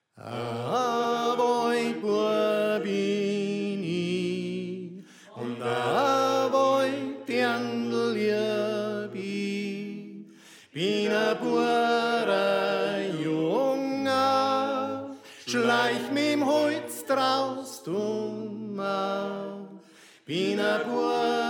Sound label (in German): Tenor; A Waldbua Bin I